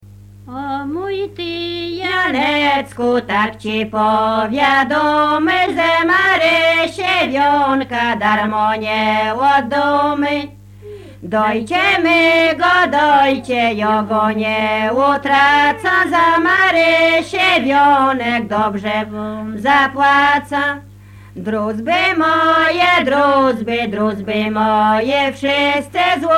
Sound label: Przypisowka Oj moj ty Janecku